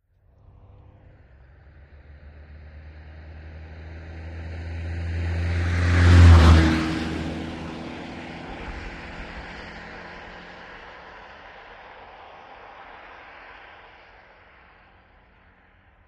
VEHICLES ASTON MONTEGO: EXT: Medium pass.

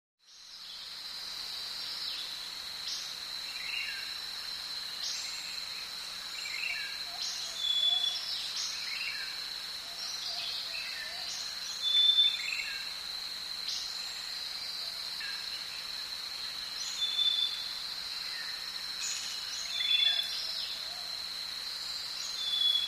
Jungle Ambience Medi; backgrounds

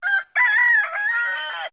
Rooster Crowing

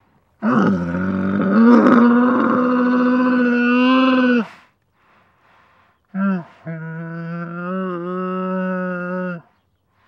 Camel Moan Long; camels

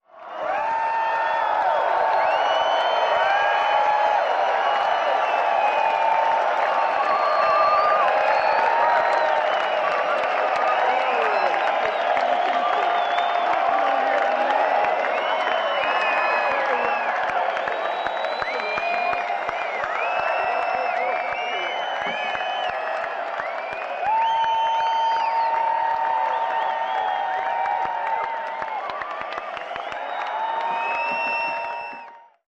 Stadium Crowd Cheers

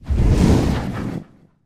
Dragon Fire Whooshes, No Crackle Element

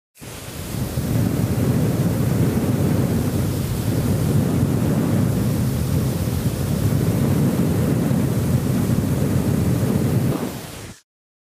Flame Thrower

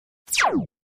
Laser Fire

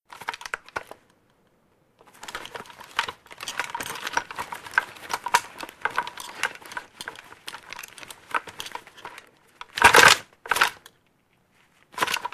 Gun Handling Light, handgun mvmt

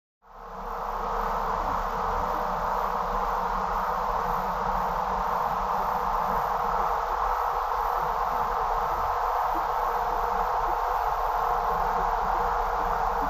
Alien Communicator, radios

